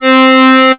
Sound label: Yamaha TX81Z Squeezebox C4